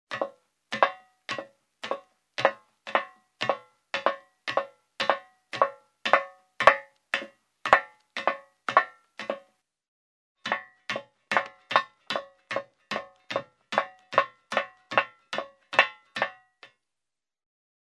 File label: Steps on a metal ladder in shoes